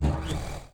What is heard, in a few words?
place Sitekick; walkingDread